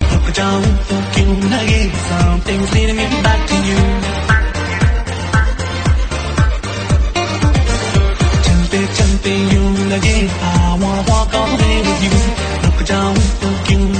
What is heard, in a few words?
Hindi Mix